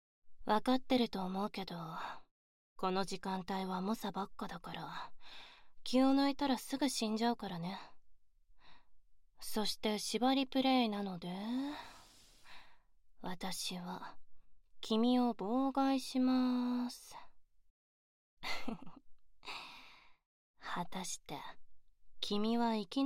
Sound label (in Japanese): 耳舐め; 耳舐め縛りプレイで神エイム